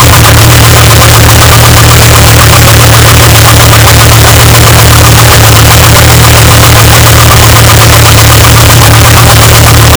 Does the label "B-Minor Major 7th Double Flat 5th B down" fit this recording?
No